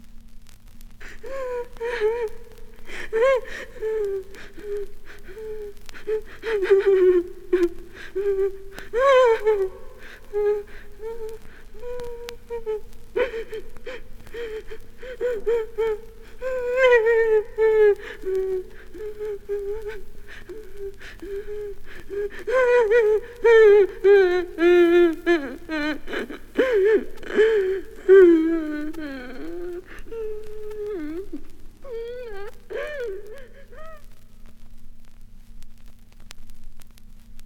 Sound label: one woman sobbing